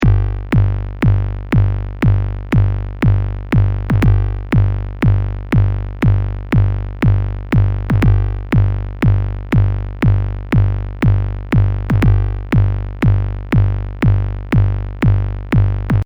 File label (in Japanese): techno, 4つ打ちのテクノ風サウンド1; 魁Reaktor道場